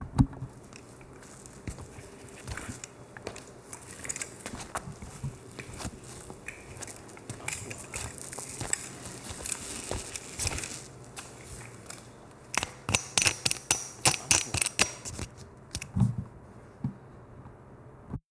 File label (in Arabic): صوت حامل إضاءة متحرك; shooting